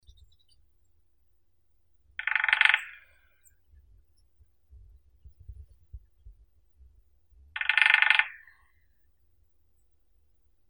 dyatel malii pestrii dendrocopos minor

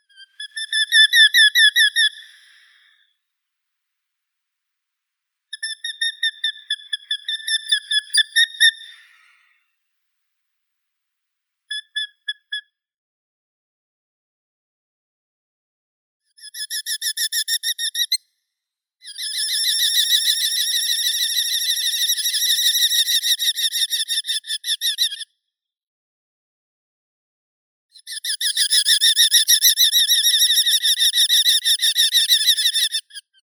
pustelga obiknovennaya falco tinnunculus ptentsi, zvuki prirodi

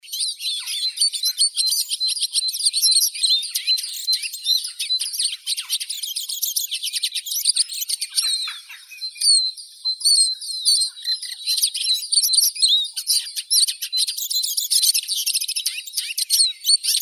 chizh spinus spinus

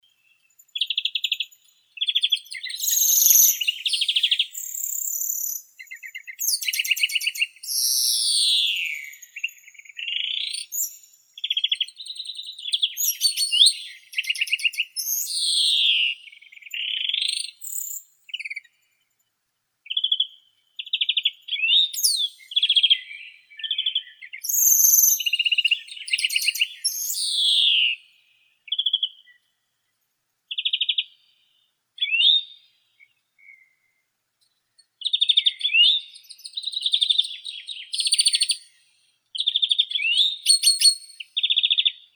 zelenushka obiknovennaya chloris chloris

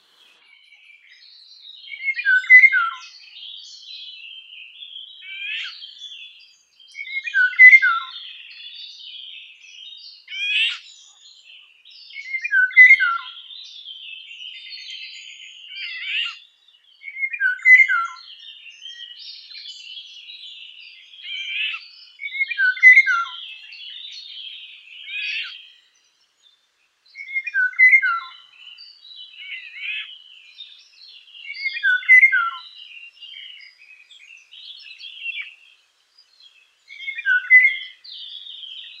ivolga obiknovennaya oriolus oriolus

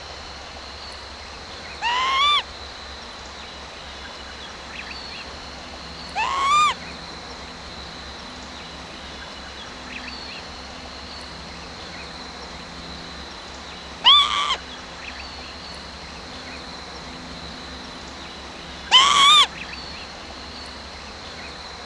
zvuki prirodi trevozhnie zvuki samki chibisa

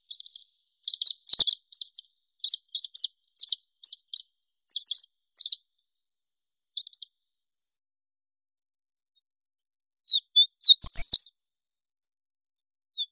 zhavoronok rogatii eremophila alpestris, zvuki prirodi

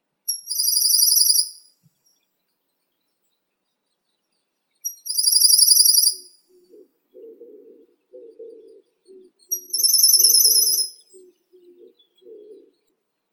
penochka blednonogaya phylloscopus tenellipes

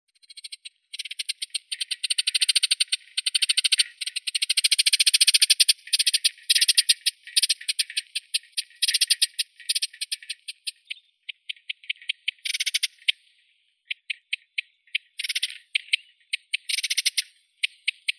slavka ruppelya sylvia rueppelli, zvuki prirodi

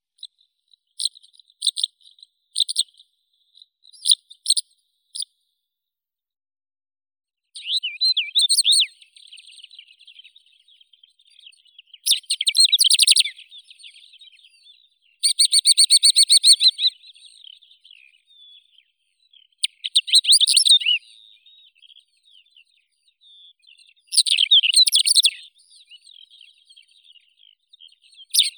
zhavoronok malii calandrella brachydactyla, zvuki prirodi

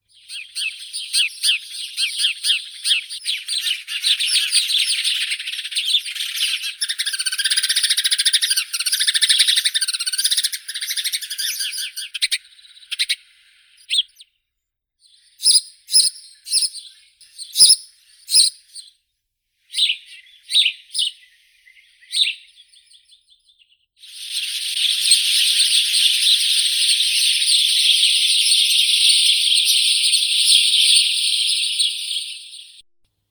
vorobei domovii passer domesticus, zvuki prirodi

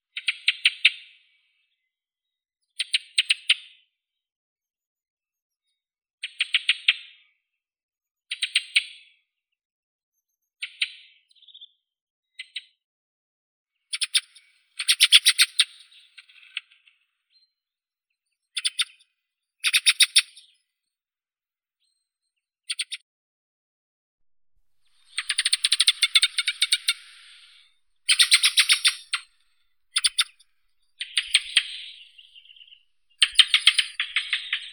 drozd belozobii turdus torquatus

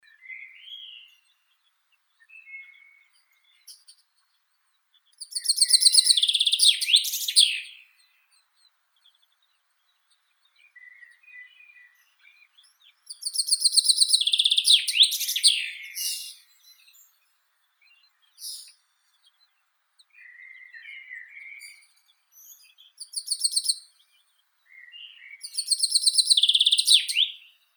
zyablik fringilla coelebs, zvuki prirodi

